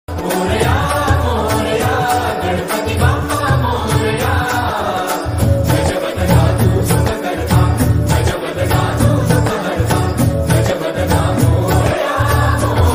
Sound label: ringtone ganpati ringtone ganesh ringtone; morya morya